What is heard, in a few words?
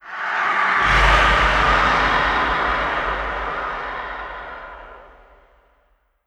cave, antlion distant scream; creatures; front l; Model Pack